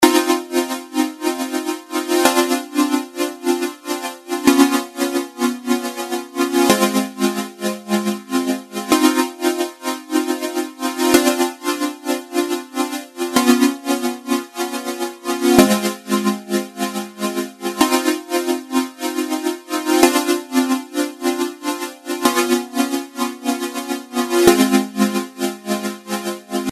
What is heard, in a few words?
gated synth